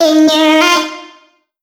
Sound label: phrase Fm, Vocal; Slate Digital Omnivox Vocal Sample Pack